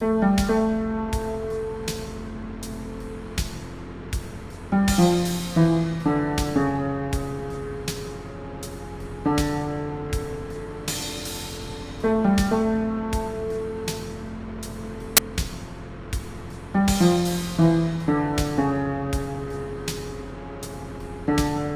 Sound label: Instrumental